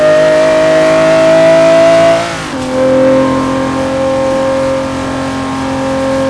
fourth cruise, vehicles

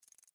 ticks, pause menu; gmod; paris

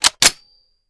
raise first charge, weapons; gmod; viper

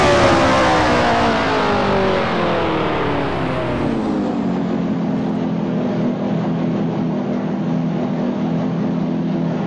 slowdown, ferrari 312; vehicles

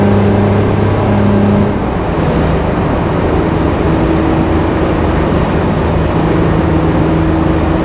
fourth cruise, vehicles; gmod; tdmcars; wrangler

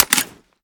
reload empty magout, sh; reloads; iw8; romeo870; gmod

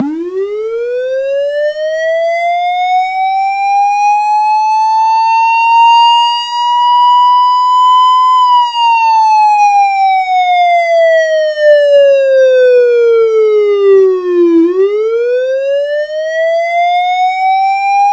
wail 60s; gmod; vcmod; els

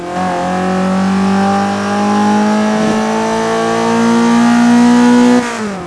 third, vehicles; gmod; tdmcars; mx5

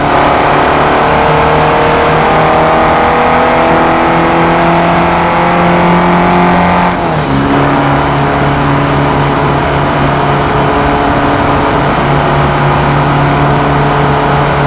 fourth cruise, vehicles; 242turbo

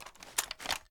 ar reload end; weapons; gmod; viper; scharlie

